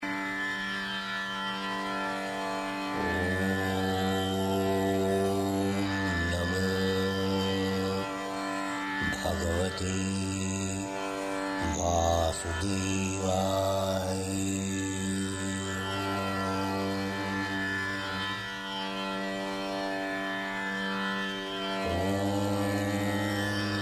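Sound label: om namo bhagavateba sudevaya, mantra; yoga; mantry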